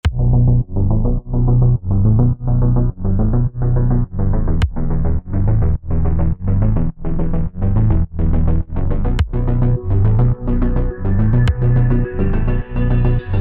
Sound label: synthwave; jigsaw trading